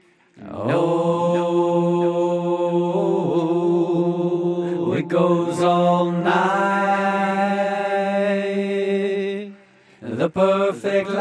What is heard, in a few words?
Lead Vocals